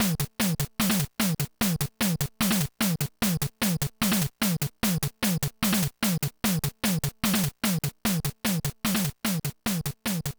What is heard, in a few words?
Stereo, Transition Beat; super spy hunter; nes